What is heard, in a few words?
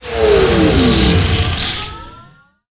Thunder Bolt